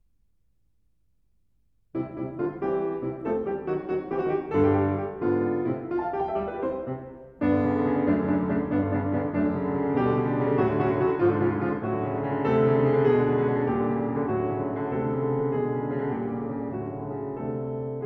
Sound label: Small Piano Pieces, Vivace; Grieg; The Complete Music For Piano